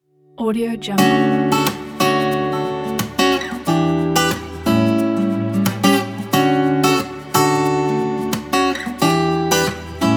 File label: folk acoustic guitar logo; cogunaudio